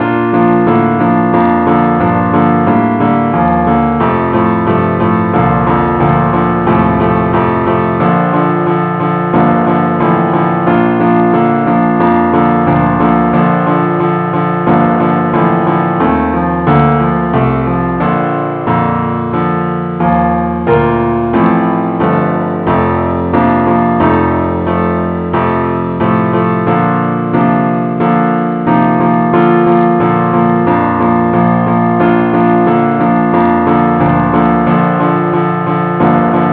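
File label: keyboard only key B flat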